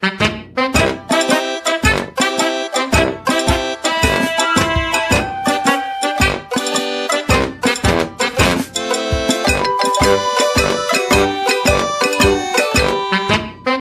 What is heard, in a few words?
Jingle Name